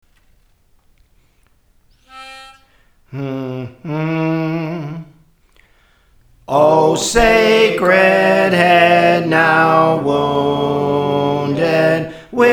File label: Soprano, Songs; Praise For The Lord Training